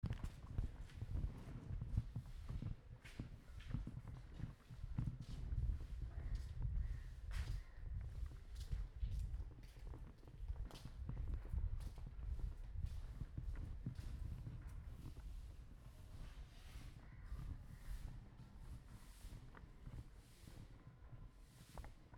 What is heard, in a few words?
sound walks ambience, Kathmandu; Kathmandu soundwalks at dawn